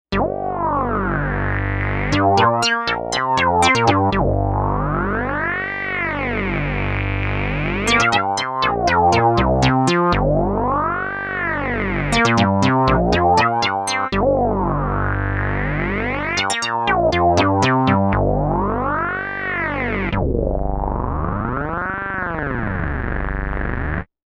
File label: NEG Sync